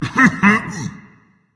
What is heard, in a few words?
clear throat berserker; cuchulainn